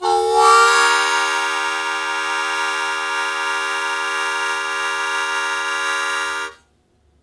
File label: Blues Chord Attack Eb1, Harmonica; Zero G - Harmonica